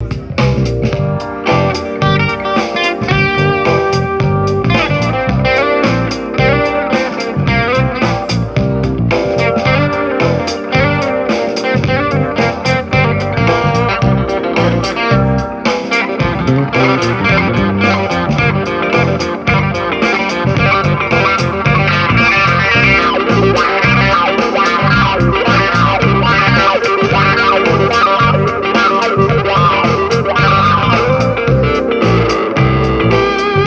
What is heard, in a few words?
some funk; blues jazz flamenco